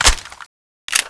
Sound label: clip in; cso; vulcanus7